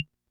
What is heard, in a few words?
Perc, rhythm330; classic machines